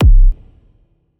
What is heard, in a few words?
Dance Kick